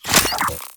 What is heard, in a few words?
Rifle Reload; Weapons; Warframe; Corpus; Ruban